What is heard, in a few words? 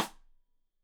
Side Stick Stereo; Deeply Sampled Drums; SMDrum